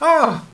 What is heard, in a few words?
"awww", hostage reactions